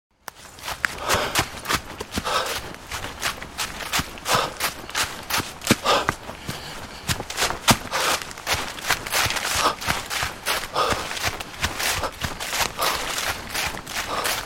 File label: Jogging, Out of breath man jogging through rural landscape.; Running; Human